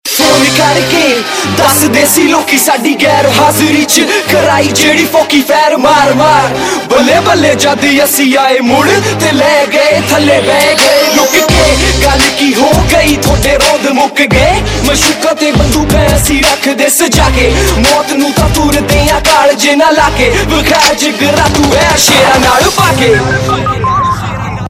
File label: Ringtone, Punjabi